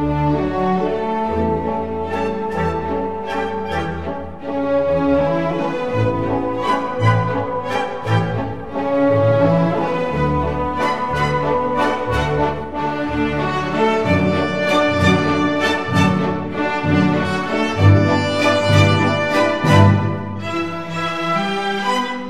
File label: Blue Danube Ringtone Classical; MUSICA CLASICA